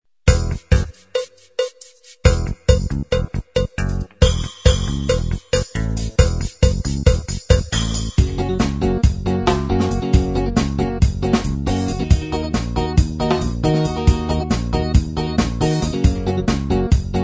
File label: style trock; gem genesys pro oriental